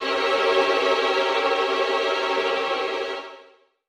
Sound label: Music Strings Swell